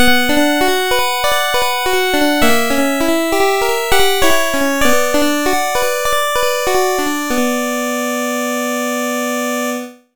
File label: Game Over; BGM Dunjon Game Over; Irodzuki Tingle no Koi no Balloon Trip; NDS